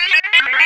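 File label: sphere blips large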